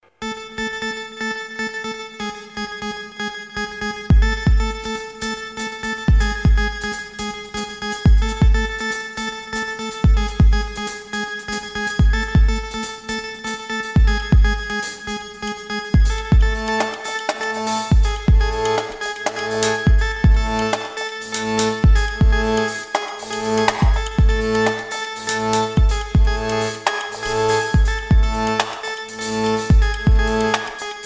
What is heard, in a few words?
Organic Drones